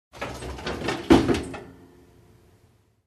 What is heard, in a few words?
Huge Ice drop in steel bucket